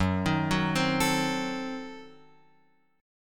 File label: F sharp Diminished 7th F sharp Guitar Open F; arpeggiated; x,1,3,1,3,4